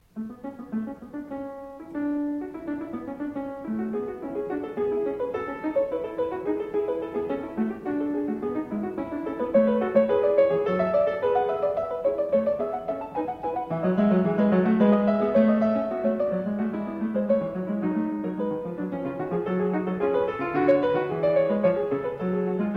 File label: Fugue in A major, Clavier; Bach; The Well-Tempered Clavier; Book 2; BWV 888